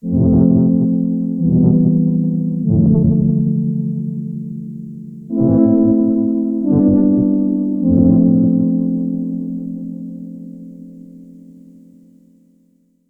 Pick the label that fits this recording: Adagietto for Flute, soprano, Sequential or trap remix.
Sequential